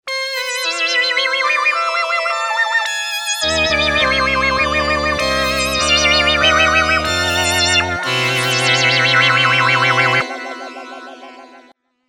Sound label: organola